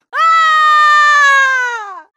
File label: fall scream; mk9 jade